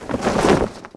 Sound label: Chute; DCS